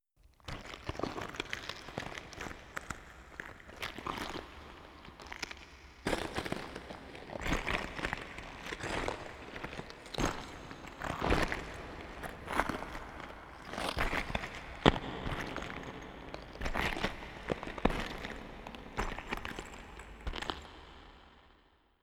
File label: Footsteps on roks with reverb; Inquisitor 3